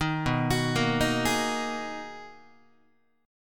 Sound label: B Major 13th, down, Guitar, BM13 chord; arpeggiated; JGuitar